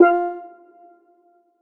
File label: steeldrum F; Polyend; ARC Noise